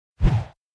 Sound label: Woosh Sound